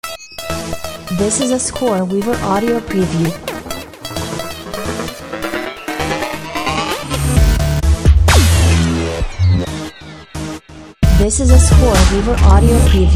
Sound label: dubstep; scoreweaver